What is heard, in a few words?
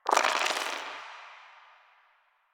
Roll Dice; UI; Warframe; Cetus Vendors; Five Fates